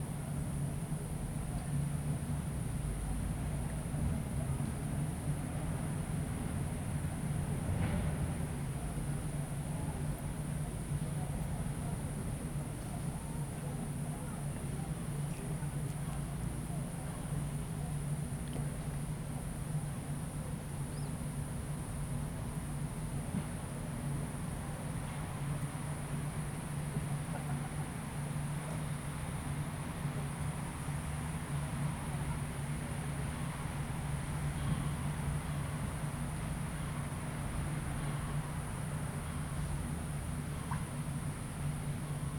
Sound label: Evening in a park